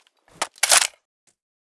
ak47 clip out, weapons

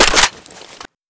psg clip in

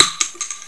m3 shell, 1897 trench

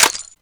clip out, model500

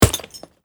hitsounds

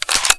mp5 clip out, sten

